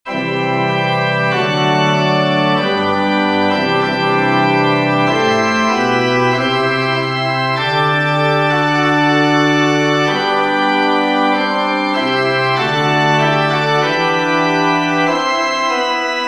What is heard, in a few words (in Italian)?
organo